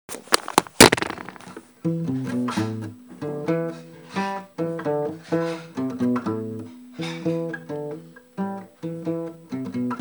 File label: bass